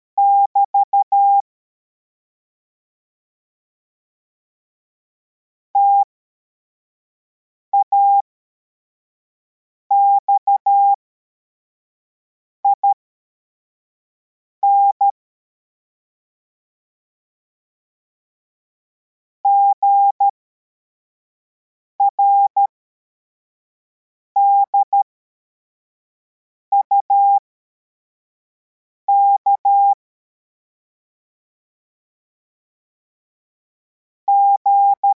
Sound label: cw, morse